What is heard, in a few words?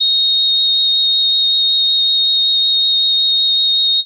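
Pan Flute DX100 A#6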